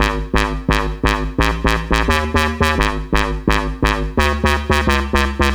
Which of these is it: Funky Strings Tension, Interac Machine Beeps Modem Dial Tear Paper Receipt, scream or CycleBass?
CycleBass